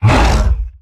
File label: Exertion Light; Evolve; Behemoth